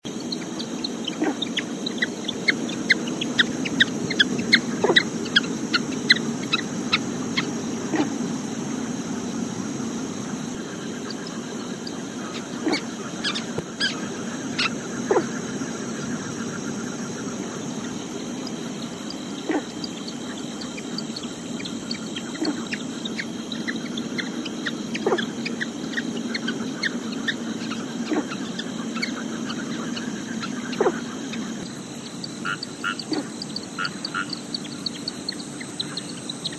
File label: taipei grass frog